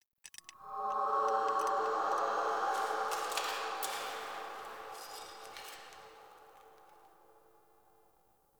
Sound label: Helmet Idle; Friendly; Tuvalkane; Discovery